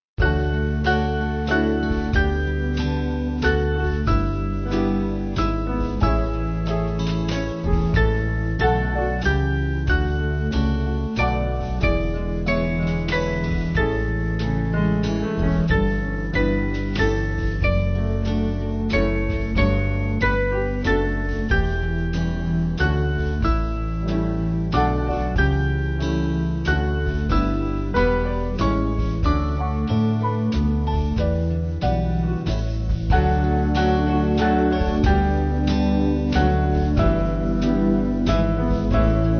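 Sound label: Band, Music Backing Files for Churches; Maryton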